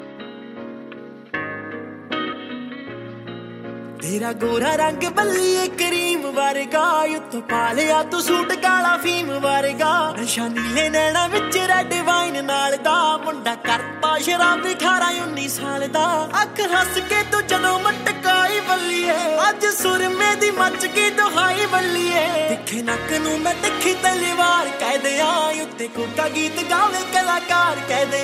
latest punjabi song ringtone

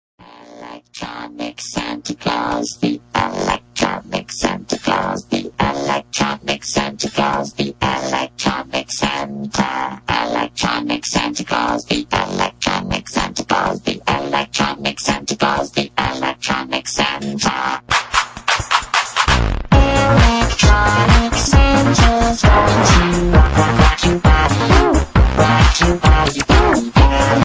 electronic santa ringtone

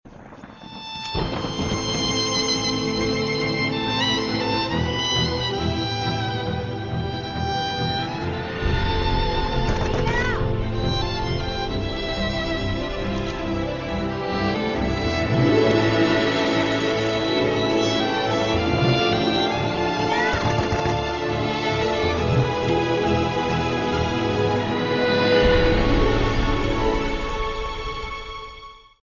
kissing ringtone; ram leela

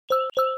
lg velvet notification ringtone

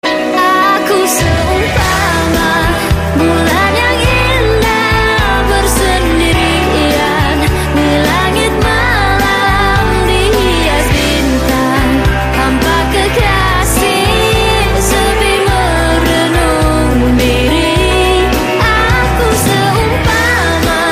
famous song tone; nokia

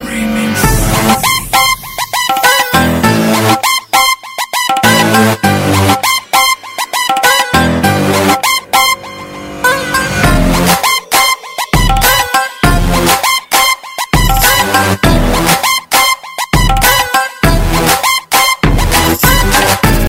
hip hop dance music ringtone

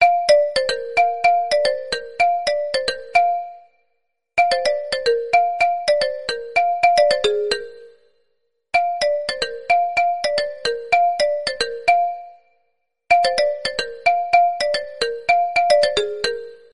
tin tin peaceful music tone ringtone; nokia ringtones

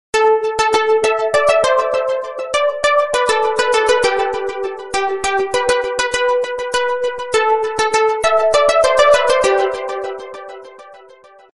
guitar sms ringtone

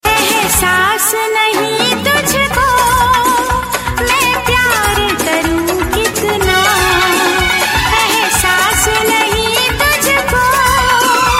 nokia hindi ring tone ringtone

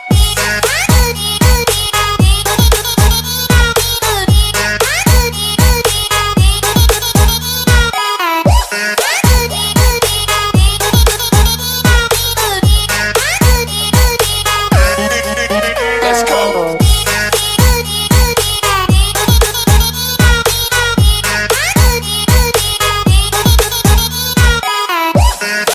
trending english music ringtone

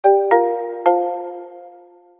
slow, nokia sms ringtone

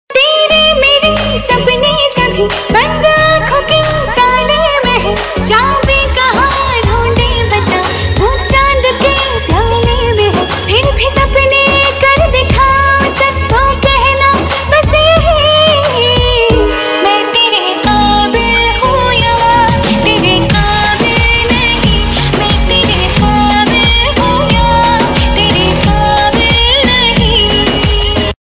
female ringtone; kaabil

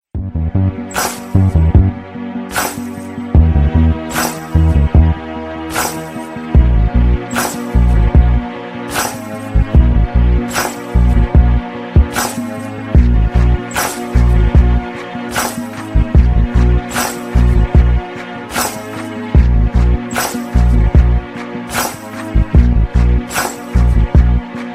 delicate music ringtone; samsung

